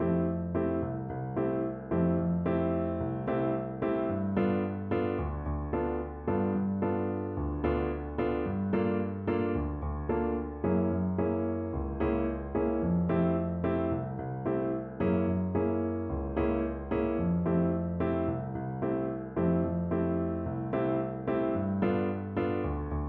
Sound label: bossa